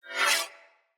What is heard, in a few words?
Sword Whoosh Accent; Fey Sword